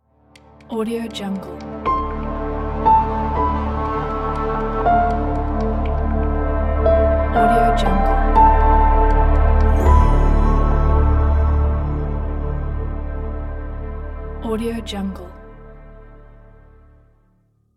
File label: mirage logo